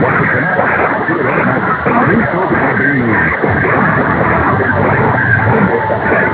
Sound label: 864 nz newstalkzb, AM; Grayland Beach State Park, WA; Perseus & various antennas